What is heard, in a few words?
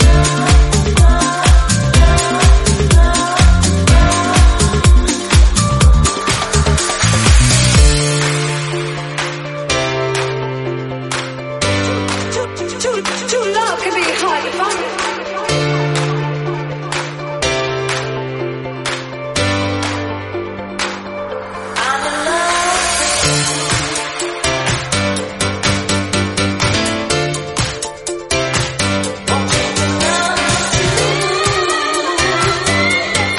124 bpm Dm